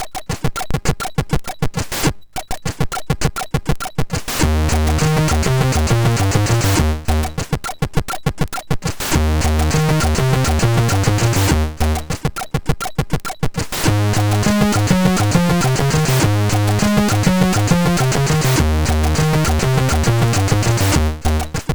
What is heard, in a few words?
Remix sid MOS6581R2; Wyndex; Cool World Remix